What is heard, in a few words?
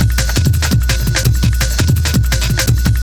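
drum loop; X-Static Goldmine 3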